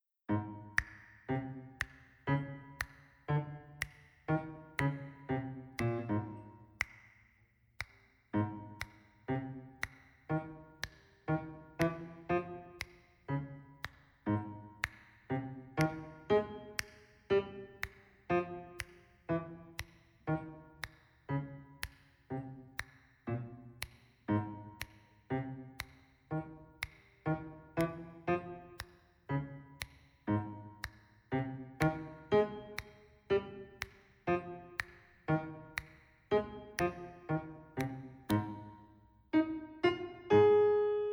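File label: Eb Accompaniment; Peace Like A River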